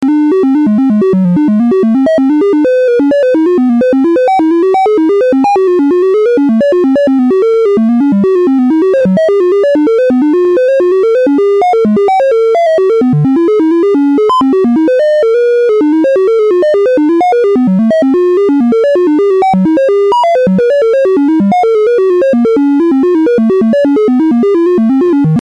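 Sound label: sample hold half steps; analog synth; voltage quantizer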